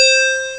ampel; speedy

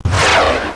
feuerwerk zisch; Revista CD Expert 42; funrun

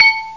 glocken; The Atari Compendium (Toad Computers) (1994); music

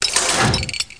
Fisch Zu

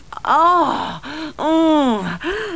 stoehn; Virtual Casino: Secret Fantasies

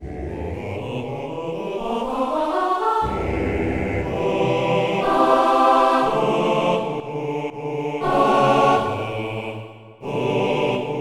Chor, Ahh Singend; Papelmedia

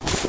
Lade Zu; Loewe1

